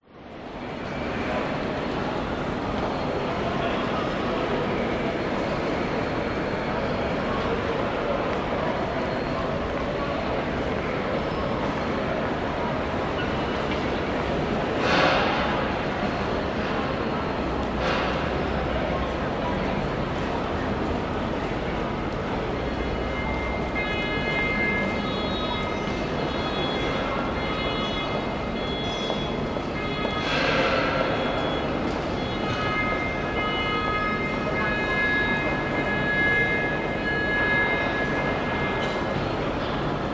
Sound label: public places train station city busy people grand concourse; central station sydney